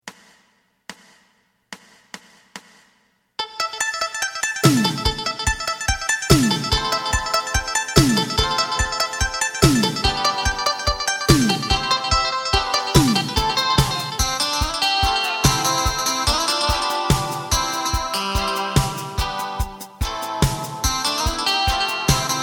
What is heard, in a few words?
bass